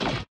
armor close; factorio 2.0.55

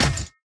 car glass impact; factorio 2.0.55

